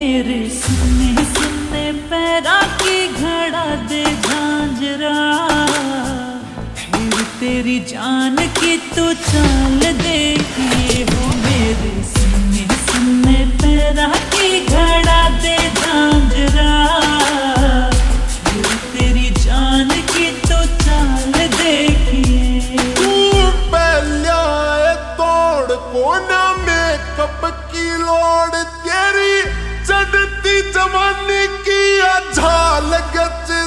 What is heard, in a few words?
Ringtone Slowed Reverb